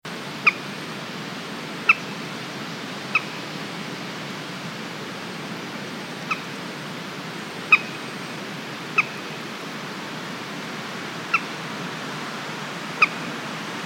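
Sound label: common moorhen voice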